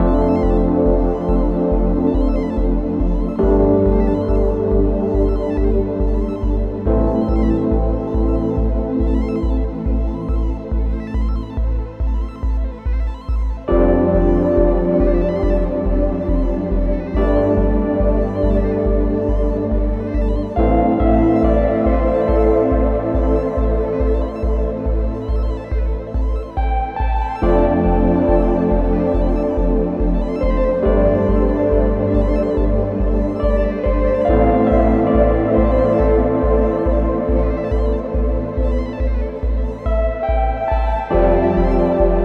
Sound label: Suspension Mellow Electro Ambient Soundscape